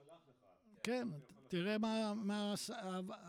VOC

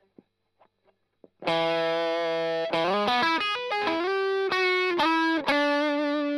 Strum Line

